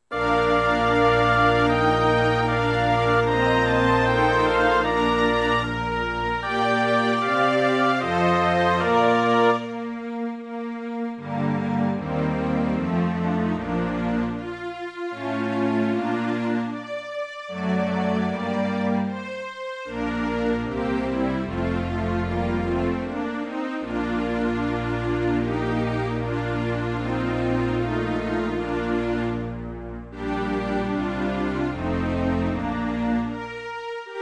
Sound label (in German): hornkonzert orchester; ホルン; 伴奏; mozart